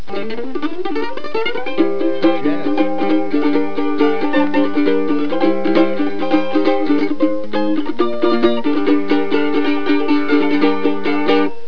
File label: mandolin